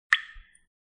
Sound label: Drip, notifications; Tecno Spark 40C